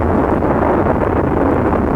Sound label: KART turbo loop; toontown-ceiling